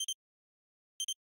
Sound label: selftimer